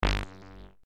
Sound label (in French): clic; spaceinvaders